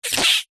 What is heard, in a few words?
cartoon yank pull slight stretch squeak